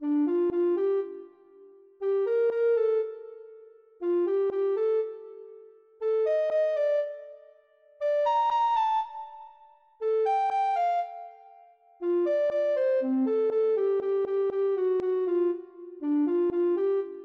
ocarina

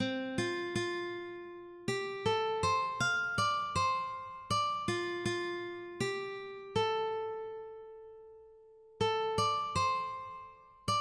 akatonbo, ukulele

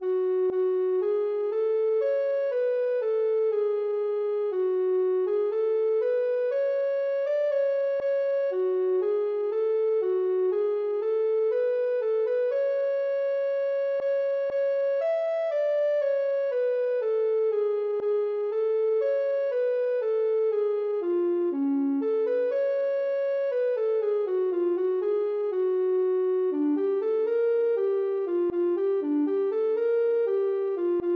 aves, ocarina

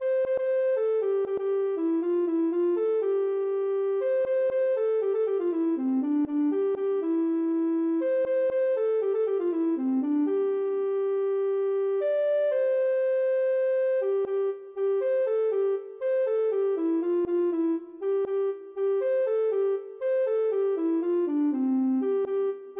grutiada, ocarina

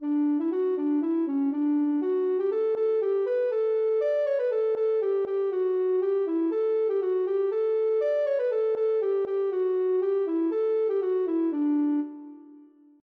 aviron, ocarina

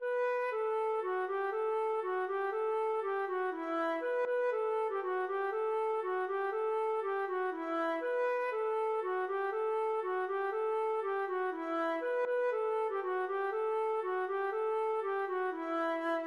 kalinka, feadóg

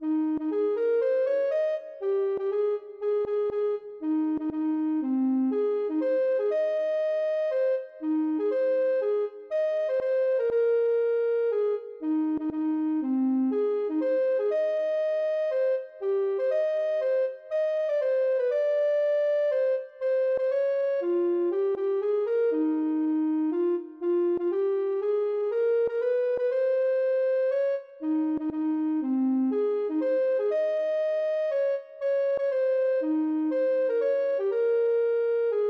ocarina

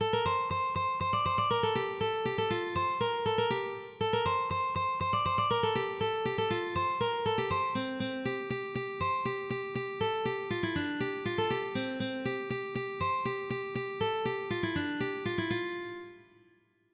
štajim, ukulele

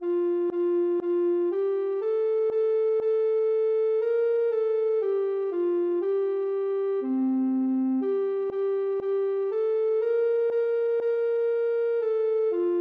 ocarina

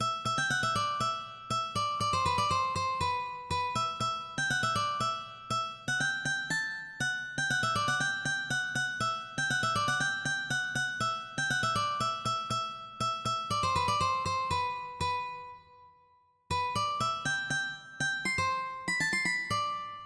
monkey, ukulele

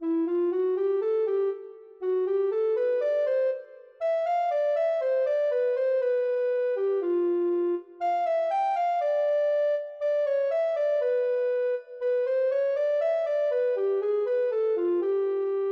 ocarina

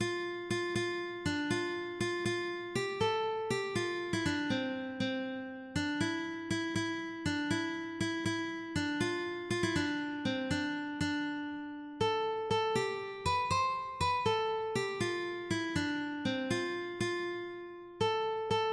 cantiga, ukulele

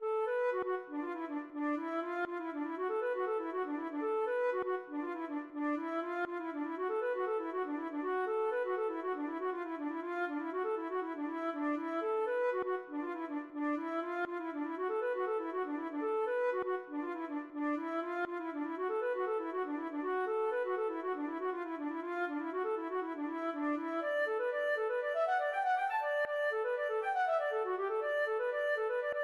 nyan, feadóg

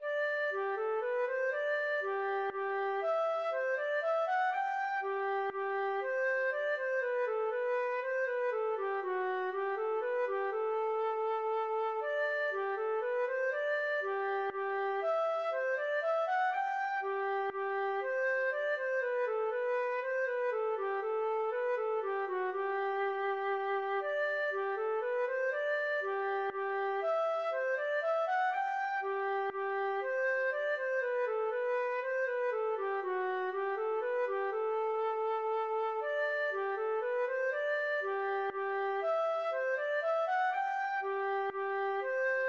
minuet, feadóg

